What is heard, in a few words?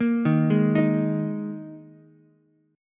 Ab Suspended 2nd chord, Ukulele; arpeggiated; 3,3,4,6; JGuitar